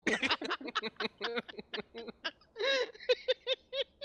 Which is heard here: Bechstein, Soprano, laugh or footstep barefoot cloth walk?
laugh